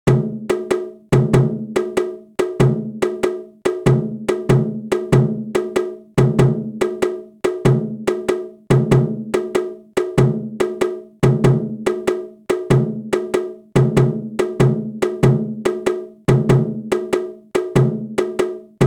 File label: shuffle inspiration shime voice 95bpm